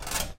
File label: Creak; Lethal Company; Windows